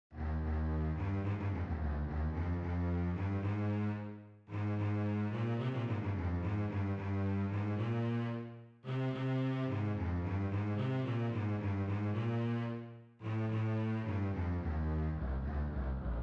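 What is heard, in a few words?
string bass fast; middle school; Region 23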